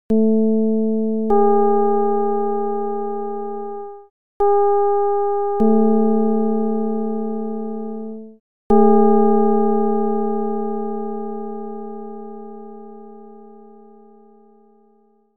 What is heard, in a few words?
csound foscil 220hz